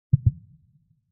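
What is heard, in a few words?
electronic mune tokun; anemoi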